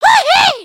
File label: excited, emotes